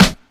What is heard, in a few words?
damped snare b key